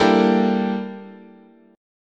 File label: G-Minor 6th Add 9th, chord, guitar